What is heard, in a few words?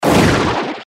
Flash Animation Multimedia; Web Interactive; Technology; Buttons; Blastwave